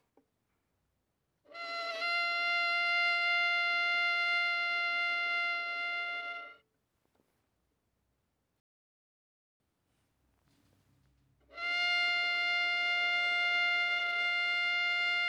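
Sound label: Violin arco fortissimo on G string E5 Gb5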